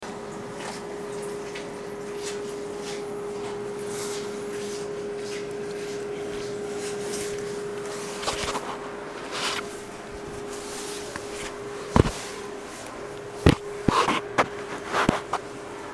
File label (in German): Unbekannte Maschine